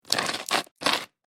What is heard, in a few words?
Toast butter spread butter knife